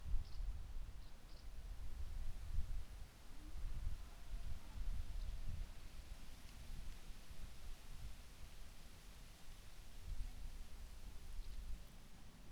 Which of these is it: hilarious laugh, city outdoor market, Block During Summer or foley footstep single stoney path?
Block During Summer